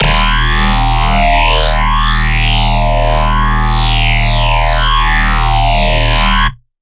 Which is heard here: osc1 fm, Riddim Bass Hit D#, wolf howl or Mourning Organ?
Riddim Bass Hit D#